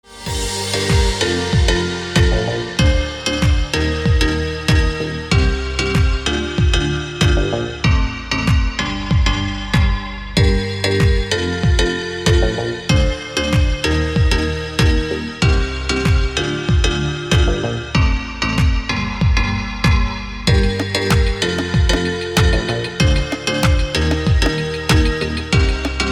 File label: anthem electronica version